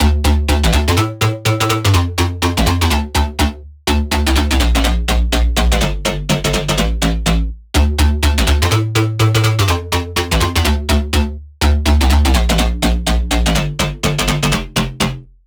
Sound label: Future House Loop - 128 BPM Dmin; Synths; Loops; Drop Loops; Cymatics; EDM Starter Pack